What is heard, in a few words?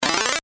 jump; animaniacs